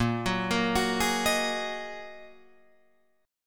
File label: A sharp 7th Suspended 4th Sharp 5th, Guitar Open F chord; arpeggiated; 10,13,10,13,11,10; JGuitar